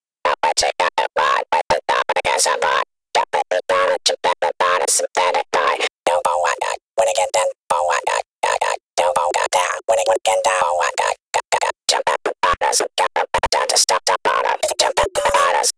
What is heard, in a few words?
Voices